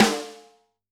Snr, drum

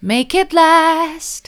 mid, vocal

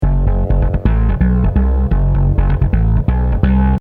bass mono

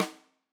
Tam Rim, drum

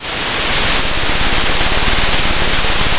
pop, Sound of a thunderstorm

